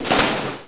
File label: water bodyfall